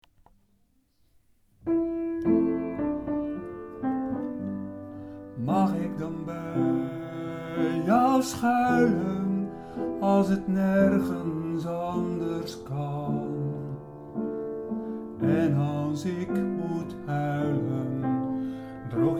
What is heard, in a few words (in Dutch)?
wantalsik zang